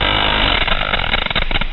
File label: momma attack